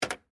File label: Computer Space Bar, Technology, Computer, Space, Bar, Large, Terminal, Keypad, Vintage; Blast Wave